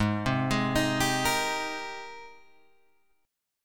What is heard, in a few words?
G sharp Major 9th G sharp, Guitar Open G